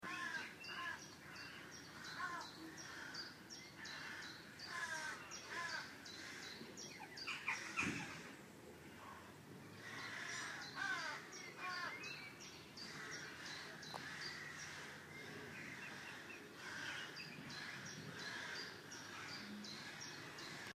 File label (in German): Krähen über den Grachten